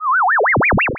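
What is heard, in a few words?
whistle, Human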